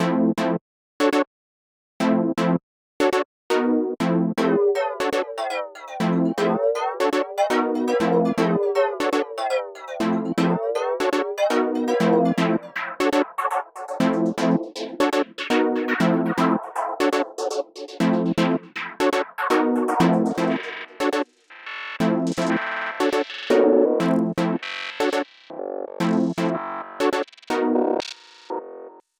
Synth Chords; Singularity

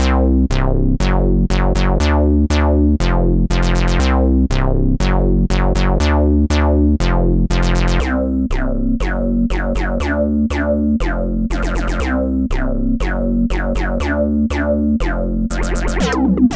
Analog Bass; Flow

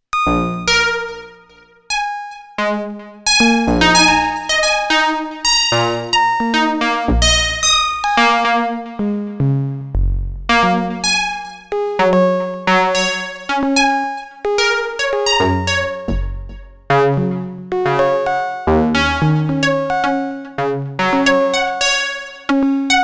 KORG Odds Stochastic Phase Distortion

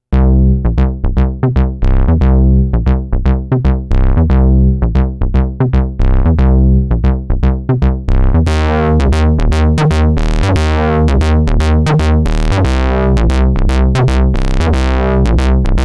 Analog Bass; Corrosion

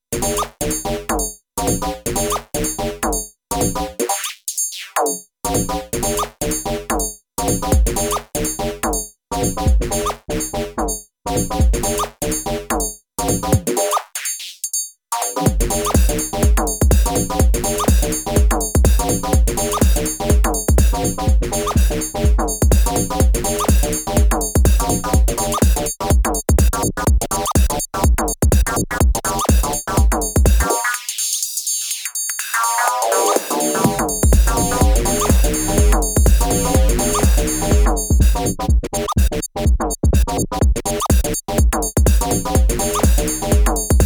House Jam, minilogue xd; KORG Groove

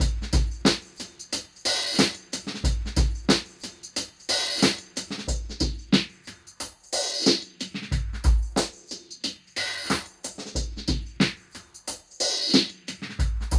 Drum Loop

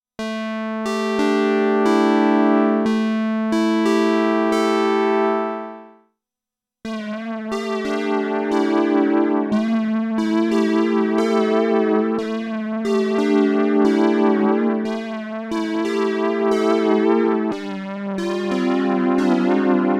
KORG FM Pluck (minilogue xd); KORG Stator

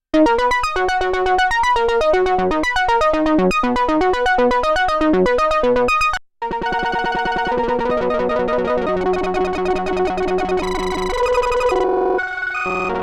Korg Arpeggio; Rerun

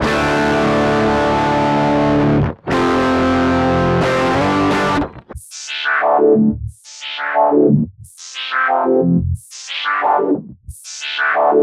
Distorted Guitar

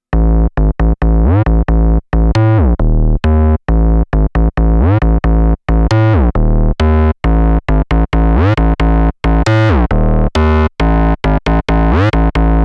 Square Push, KORG Node

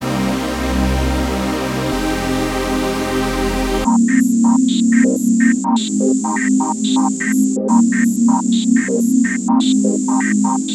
Synth Chords

